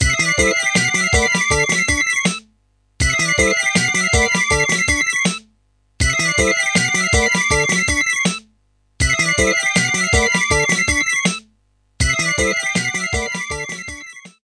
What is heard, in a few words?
Dreggae